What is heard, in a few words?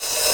Reverse Cymbal